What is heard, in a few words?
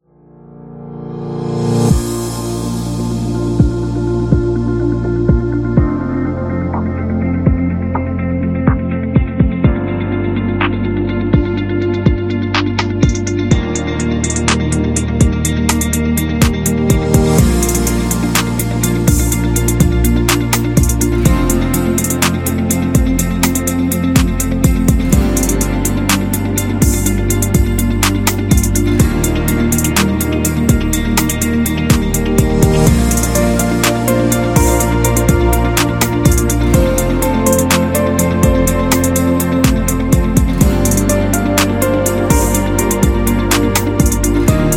Real Estate Music